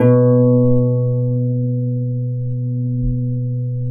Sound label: B2 HARP MF; USB Soundscan vol.62; AKAI